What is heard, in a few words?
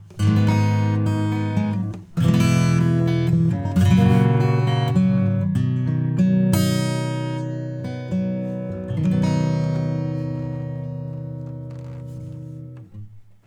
Compressor Acoustic Guitar; Neutron 4